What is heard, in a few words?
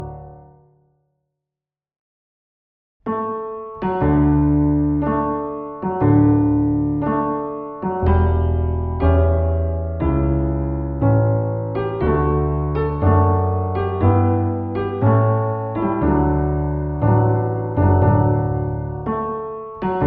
new coldstream march; crawshaw